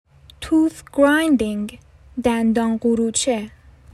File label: tooth grinding